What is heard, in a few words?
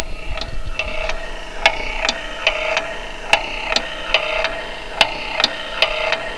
ROCKER, MACHINES